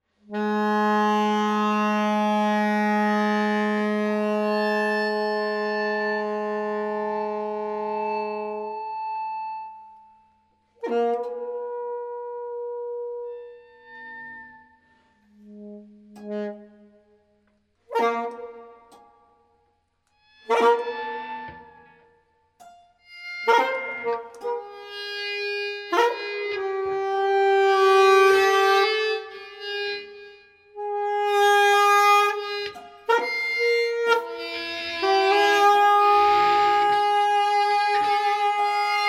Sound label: tarogato guitar